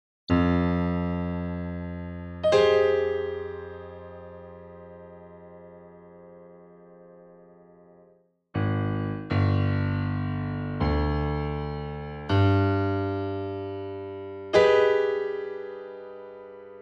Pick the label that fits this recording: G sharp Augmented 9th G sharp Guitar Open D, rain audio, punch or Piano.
Piano